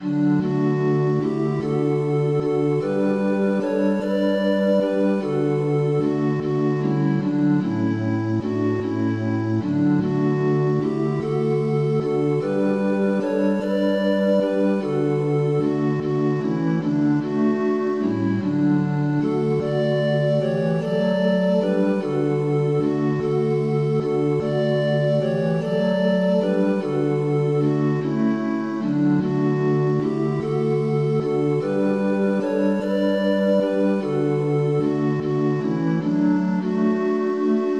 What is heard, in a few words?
polyinstru; O Dieu supreme est ta grandeur; hymns